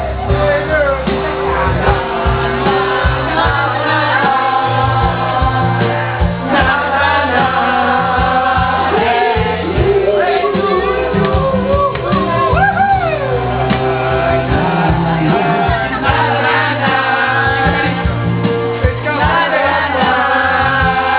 singing; Italy; Dolomites

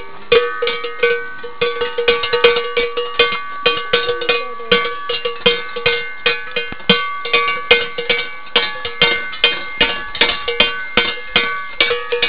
dolomite cows; Animals; Europe; Italy; Dolomites

